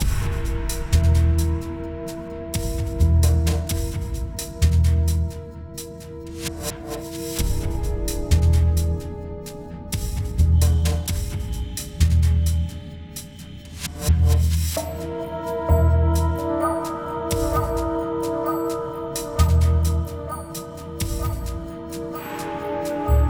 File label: music reveal contraband